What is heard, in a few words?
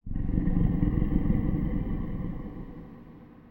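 giant creature breath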